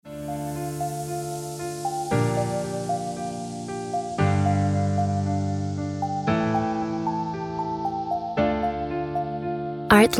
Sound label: Ringtone